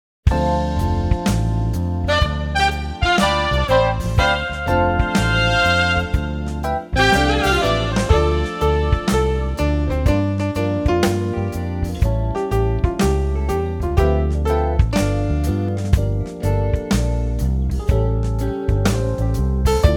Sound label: D O G Instrumental